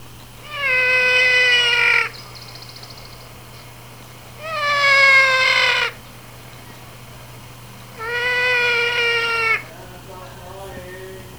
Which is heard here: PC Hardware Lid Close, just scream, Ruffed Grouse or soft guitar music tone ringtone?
Ruffed Grouse